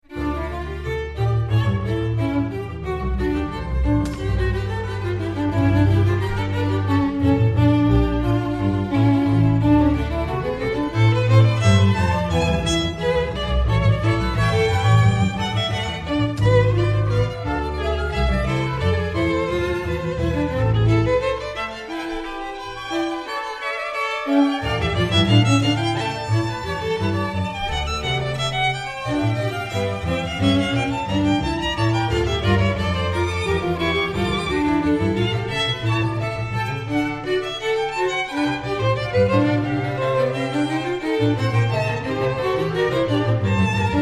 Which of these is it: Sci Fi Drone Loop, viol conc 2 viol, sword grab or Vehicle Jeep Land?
viol conc 2 viol